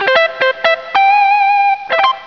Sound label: Fanfare; Widget Workshop; A Mad Scientist's Laboratory (1996); Maxis